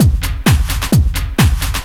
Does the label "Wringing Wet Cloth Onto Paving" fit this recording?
No